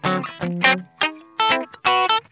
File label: BLUE, Funk Guitar; Zero G - Funk Guitar